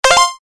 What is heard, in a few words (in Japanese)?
決定 高音; モノラル